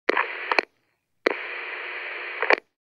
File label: Wireless walkie talkie